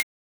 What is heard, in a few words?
ticking